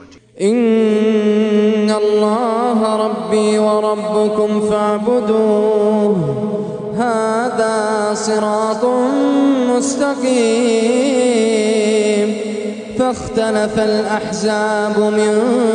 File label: Quran